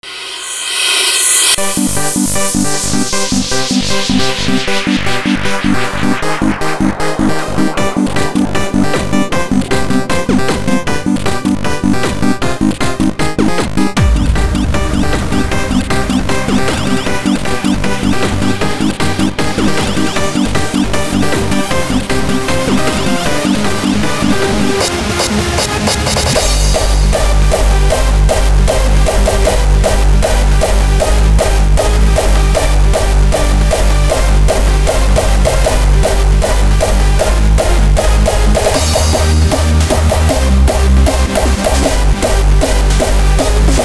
Beep Boops; Skavenger

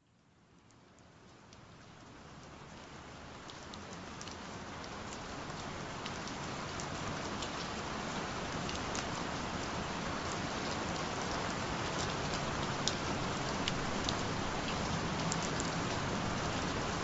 gentle rain sounds

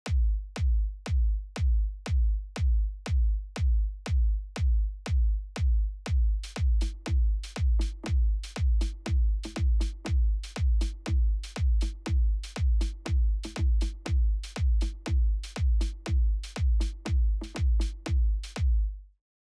Perc

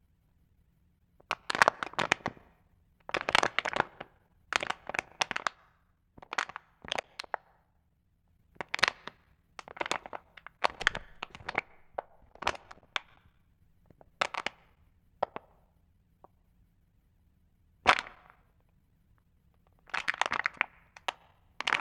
Bottle Bone Break

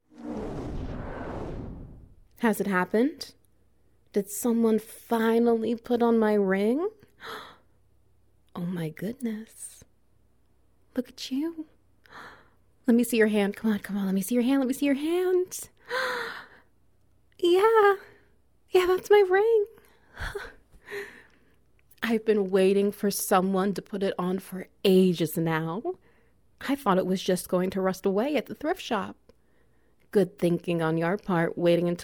SFX, wet noises; Potential Protege

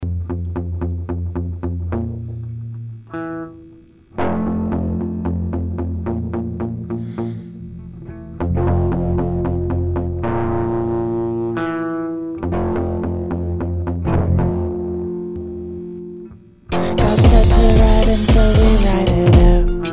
Generator

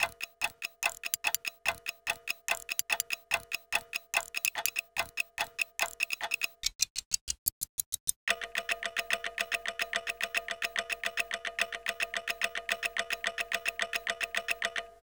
Tick Tock Clock Clocks

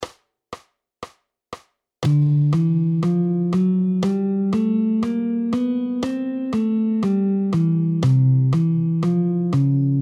walking bassline

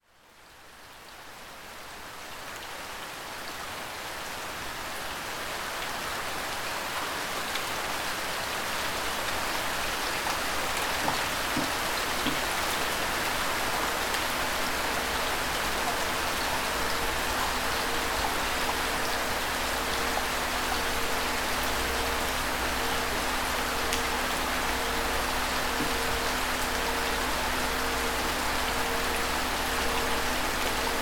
Rife Frequency, Gentle Rain Sounds

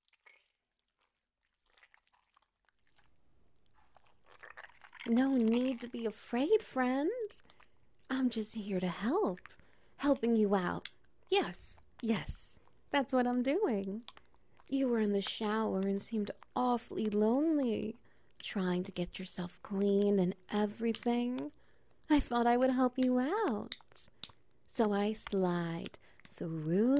shower slime, wet noises

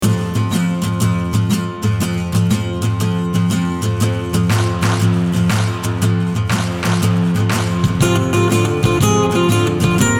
Shuffle or Acoustic

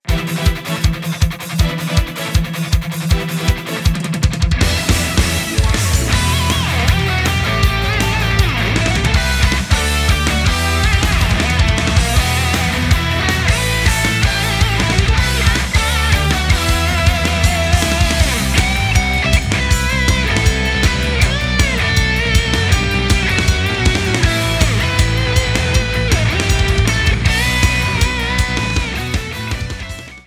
GaMetal

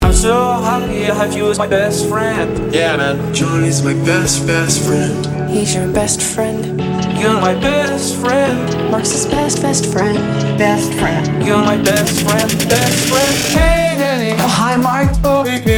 best friend ringtone android

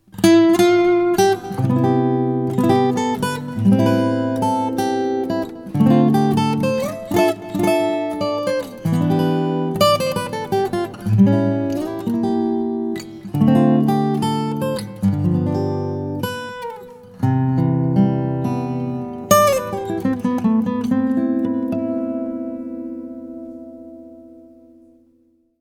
Jazz Chord Melody Routine

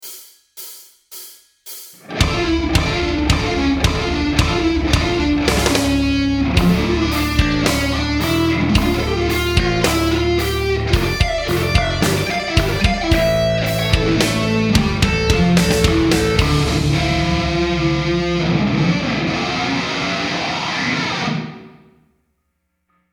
Sweep Arpeggio Bursts

